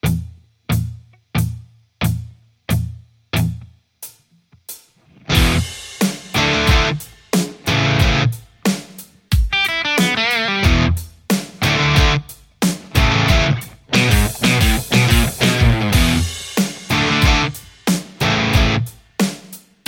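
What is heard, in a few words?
Stratocaster; mercuriall harlequin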